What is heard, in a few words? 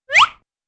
whistle pick up